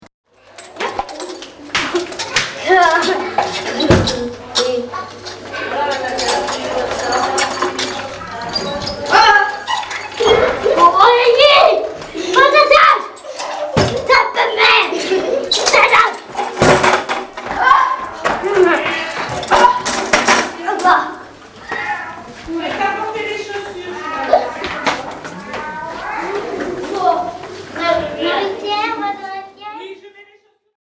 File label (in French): Communication Non Verbale; pathologie